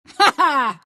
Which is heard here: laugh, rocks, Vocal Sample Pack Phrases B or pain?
laugh